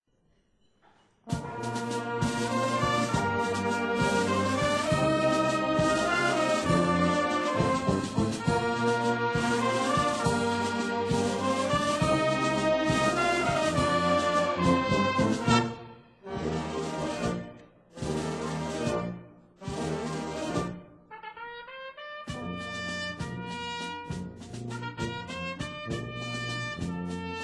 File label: Golden Trumpets